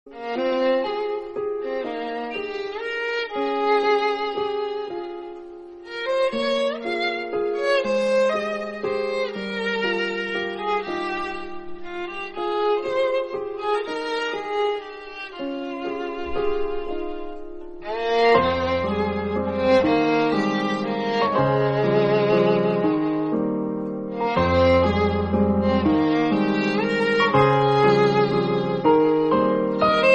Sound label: korean drama bgm; boys over flowers